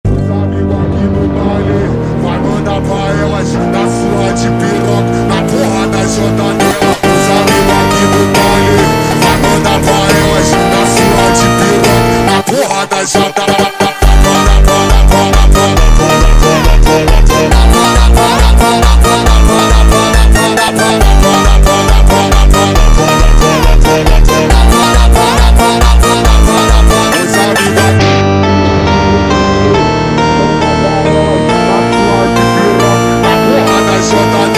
Funk sped Up, funk

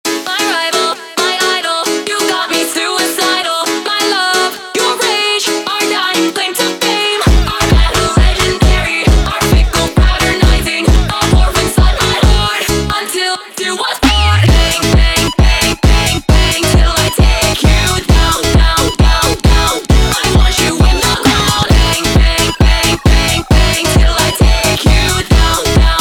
Funk

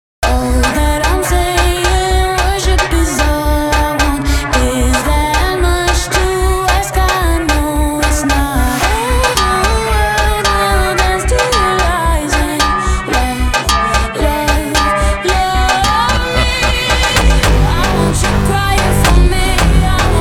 slowed; funk

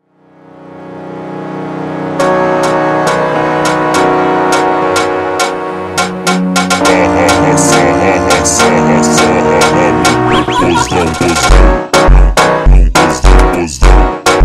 Funk ultra Slowed

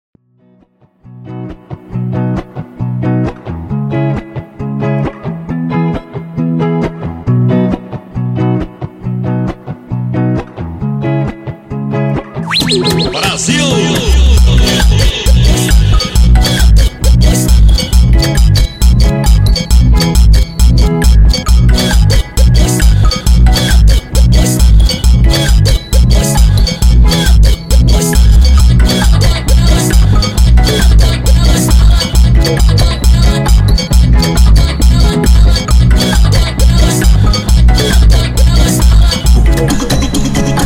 Funk

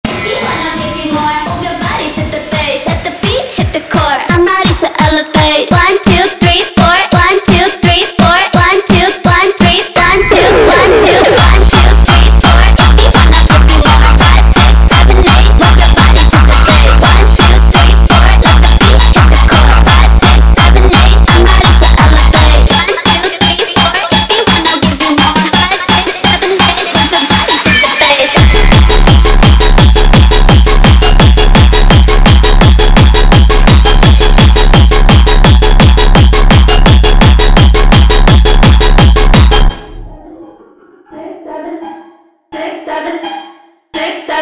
sped Up, funk

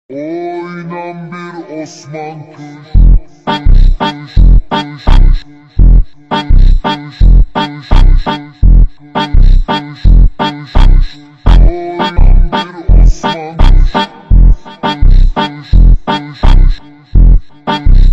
Funk super Slowed

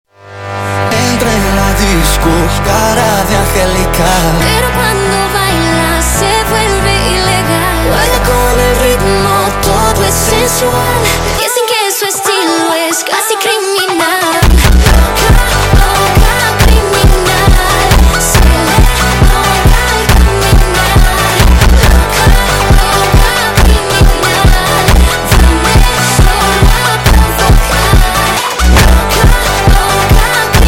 sped Up, funk

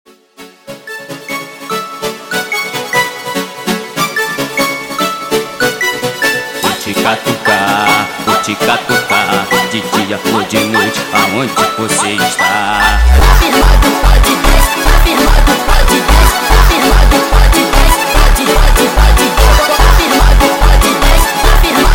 sped Up, phonk music

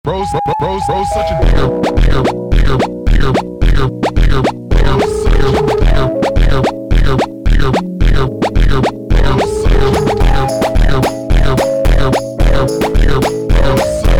funk super slowed

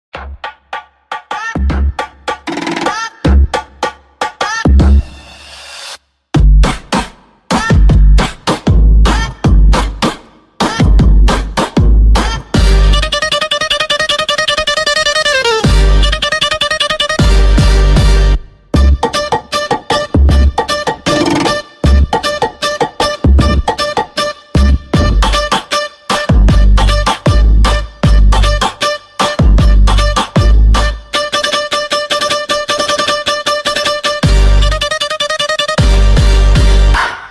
Airtel Funk ultra Sped Up

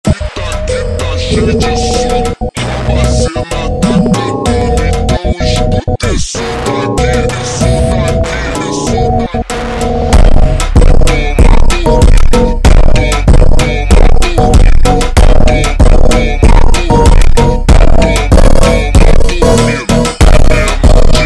Funk super Slowed

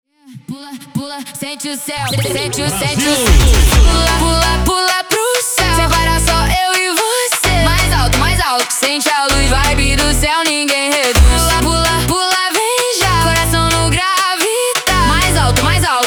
Jumpstyle, funk

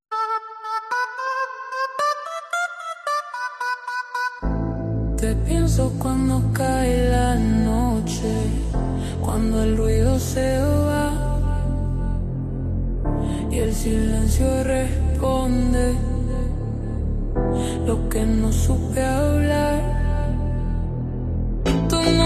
Slowed, فانک

